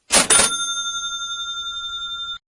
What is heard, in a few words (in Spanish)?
caja regist